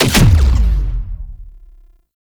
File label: Incarnon Wrist Gun Alt Punch, Weapons; Warframe; Tenno